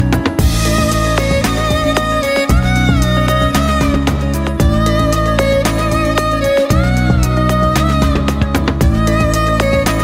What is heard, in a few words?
flute ringtone